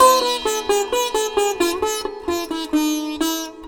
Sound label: SITAR, Indian Traditions